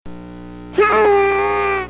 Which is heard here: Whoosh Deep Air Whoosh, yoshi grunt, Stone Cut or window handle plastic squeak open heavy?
yoshi grunt